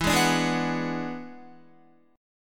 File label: E 9th E down Guitar; strummed